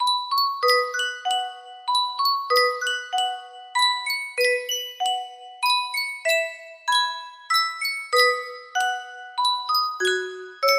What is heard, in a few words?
Sankyo custom tune music box waltz